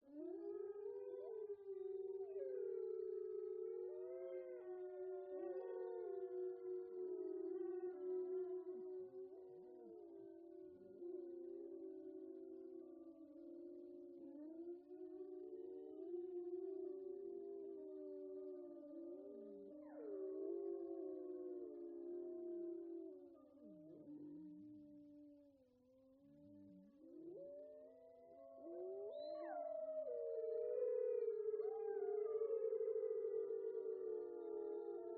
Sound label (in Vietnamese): bay soi tru len vang trang dau do xa xa